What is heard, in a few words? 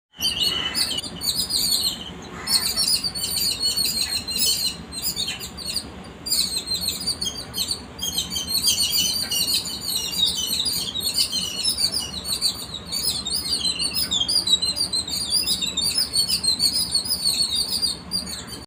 Richard's pipit; پیپت پا دراز